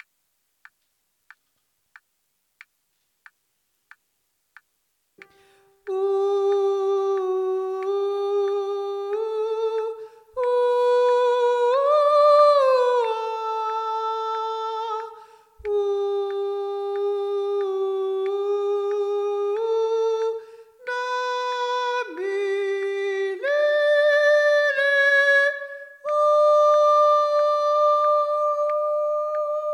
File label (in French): sopranes